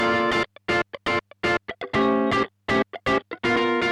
guitar funk; Morphdrive

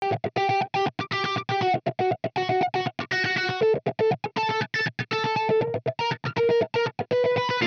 amp guitar flanger; souldriven; Morphdrive

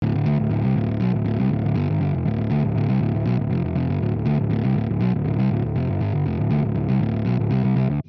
amp honeybee g5 bass; Morphdrive

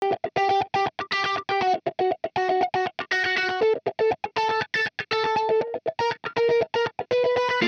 amp guitar flanger; honeybee; Morphdrive

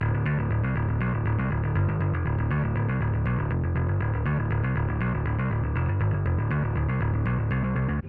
bass; wet; multiband; prism distortion

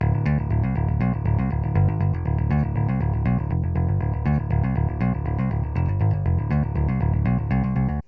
bass; wet; multiband; prism distortion

